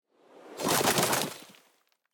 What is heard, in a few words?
Arrowstorm; gamerip